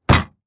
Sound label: Transportation Cars Honda Civic Close Trunk